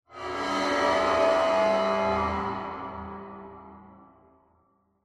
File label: cymbal med; ambient; cymbals